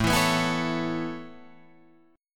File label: A Major Add 9th A Guitar Drop D, chord